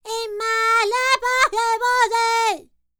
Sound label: Vocal Planet World Voices, NOMADIC